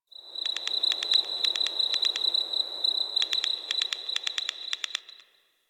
Night Tree Critters; Ambience; One Offs; Warframe; Eidolon Plains

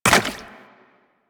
Run Goo, Footsteps; Warframe; Tenno

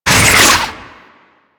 Pirate Cannon Projectile Whistle; Warframe; Warframes

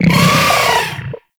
Arachnoid Berserk Vocal Attack; Warframe; Corpus; Arachnoid Hunger

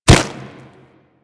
Kubrow Step Snow, Footsteps; Enemies; Warframe

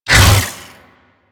Hammer Shield Whoosh E; Crp Hammer Shield

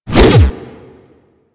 Blaster Punch Swing; Warframe; Tenno

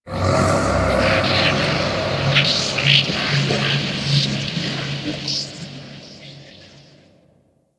Disturbance; Ambience

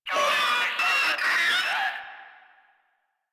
Proto Infested vocal attack; Warframe; InfestedNinetyNine

